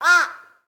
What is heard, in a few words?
cawing jungle crow